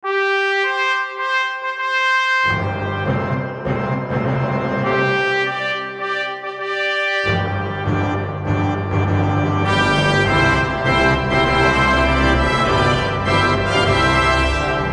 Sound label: marcia per messa natale march for christmas mass